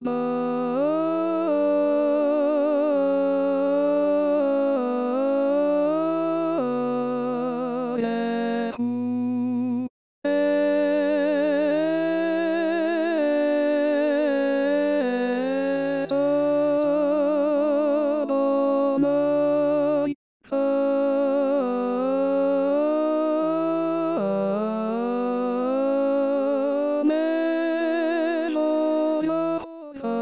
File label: barechu playback